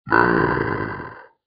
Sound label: Growl; Gargamen